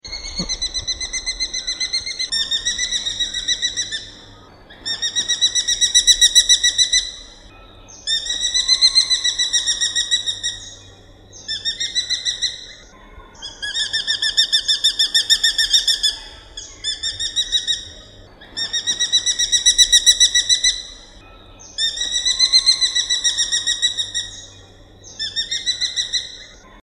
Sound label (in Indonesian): suara alap macan